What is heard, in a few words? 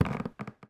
Footstep Wood-Creaky